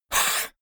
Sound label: monster hiss flat; koerpergeraeusche; comedy-cartoon; soundpool